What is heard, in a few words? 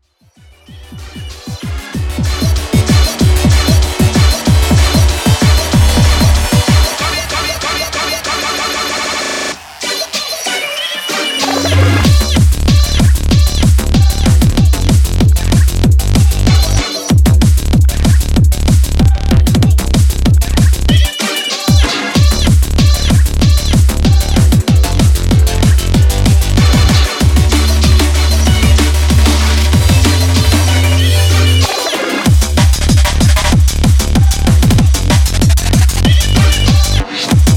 Crazy Jungle